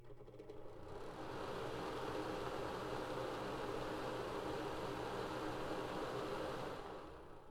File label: Fan Running